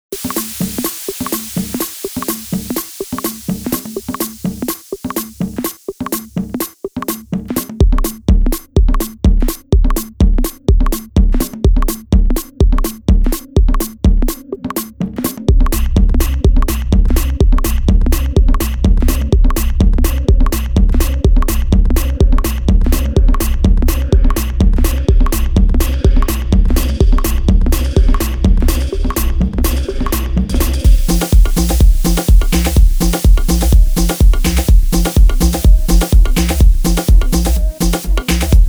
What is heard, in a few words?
Friendly Funk